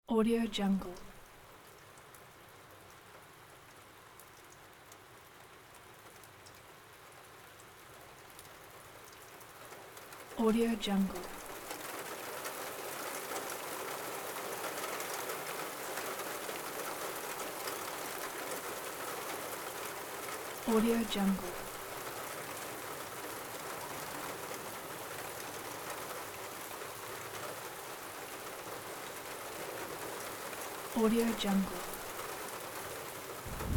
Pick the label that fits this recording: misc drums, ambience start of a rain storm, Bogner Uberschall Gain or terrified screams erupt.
ambience start of a rain storm